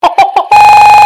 laugh; suicide mickey

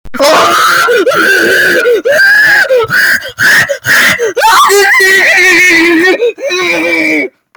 screaming warning loud

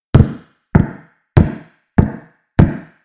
footsteps loud

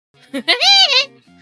polka

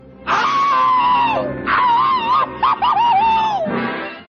screaming

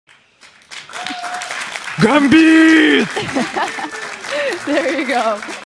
war cry

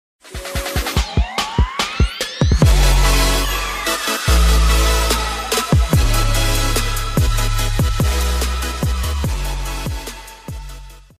follow sound alert sound and donation sound; twitch

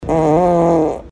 fart

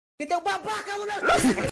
rage

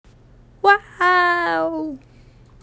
Woow

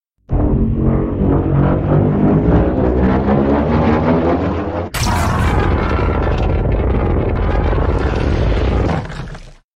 dragon breath; godzilla power up sound

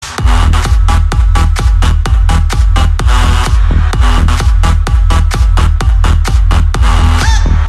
bass boosted

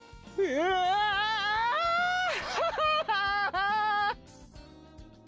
creepy

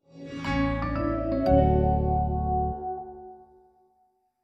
welcome sound

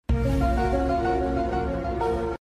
mystery

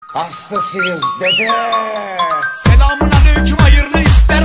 aaaaaaaaaa

